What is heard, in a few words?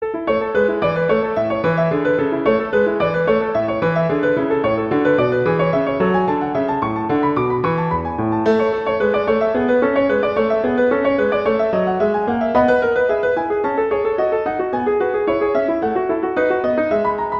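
Allegro, Sonata violin guitar; Paradisi; Toccata Paradisi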